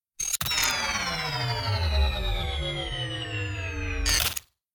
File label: down; Cosmic Rage; activities planetoid